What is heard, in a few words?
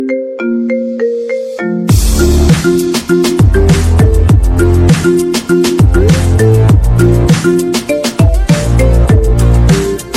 Marimba Ringtone